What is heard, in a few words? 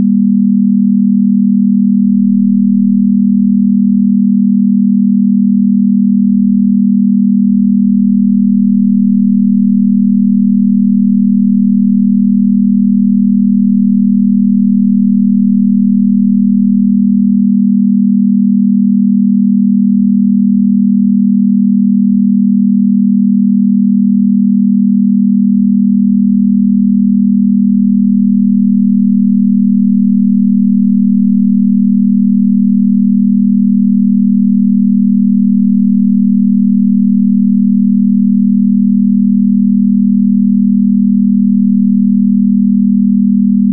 gamma 40hz; focus